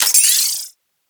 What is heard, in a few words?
Greed Point Pickup; Warframe; Pickups